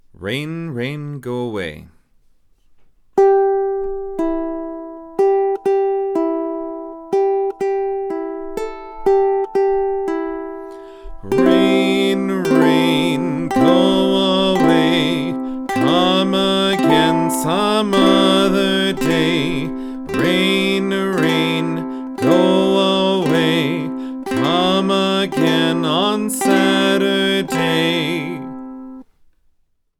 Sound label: Rain Rain Go Away, ukulele